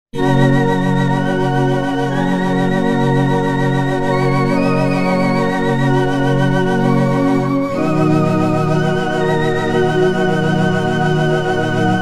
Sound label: yamaha sy77 pads